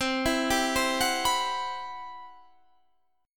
C Major Sharp 11th, Guitar Standard; CM#11 arpeggiated; JGuitar

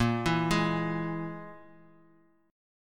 E Major Flat 5th A sharp

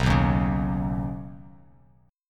B Major Add 9th B Bass Standard; Badd9; strummed; 7,2,1,6

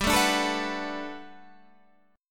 B Minor 7th G, chord; Bm7/G strummed

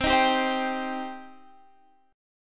C Minor C down Guitar Standard; strummed; capo 3

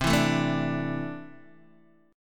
C 6th Add 9th C down Guitar DADGAD

